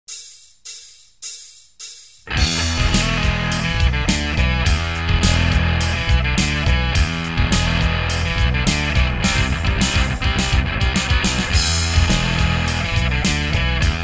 Nu Rock; Soundzabound Volume 1 Disc 2

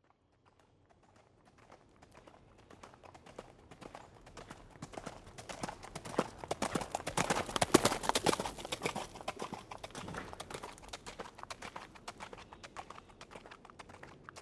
horse trot by

